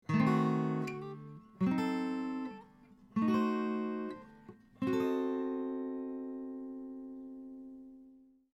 Diminished 7 Guitar

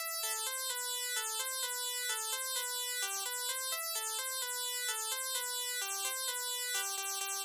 Synth With High Pass Filter

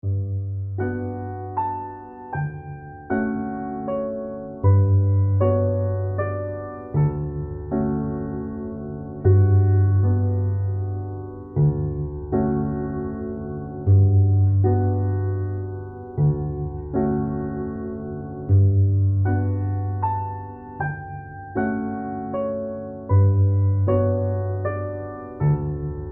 Steinway; 1928

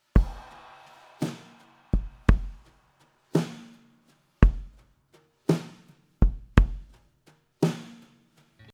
Kick Attack Up

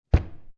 Pitched Book Drop

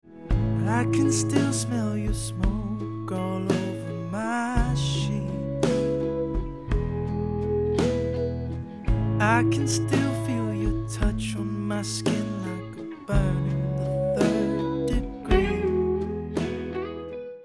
Vocal Mix; EchoBoy

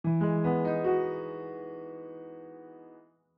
Piano With Haas

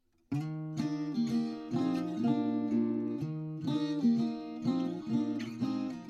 acoustic guitar 6 semitones up

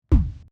Pitched Sub

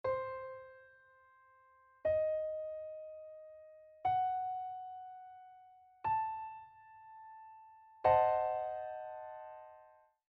Diminished 7

